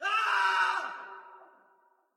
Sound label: survivor scream, zombie